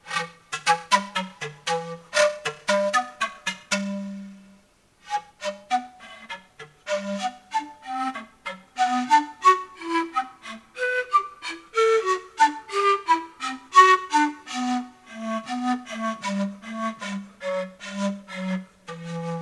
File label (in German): zampona tief